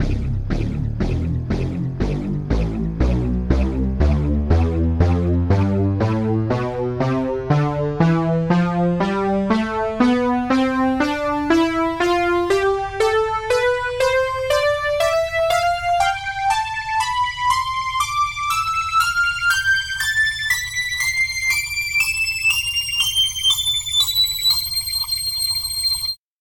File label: synth brass; tonleiter